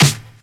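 original snare one shot A key, acoustic snare tuned to the A note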